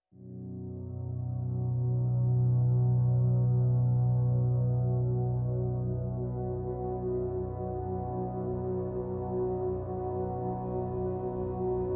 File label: Pads Absolution (B)